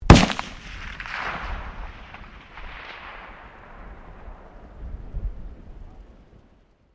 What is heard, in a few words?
RIFLE MAG-1 FIRING; Rifles, non military; Rifle Sound Effects; Rifles (Sound Effects:Guns)